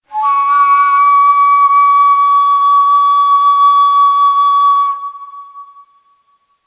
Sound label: Tiz Buselik, Neysample